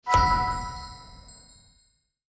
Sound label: Magic Score; HintsStarsLite